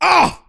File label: pain; sven; crimson commando